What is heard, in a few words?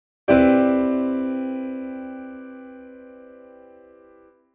piano